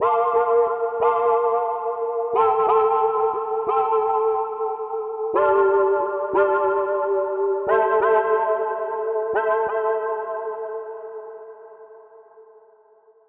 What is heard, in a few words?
Chords Rhythm Neo Soul